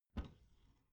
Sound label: bathroom cabinet door creak open regular